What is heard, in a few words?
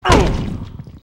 Monster Lead Pipe; silent hill 2; Prison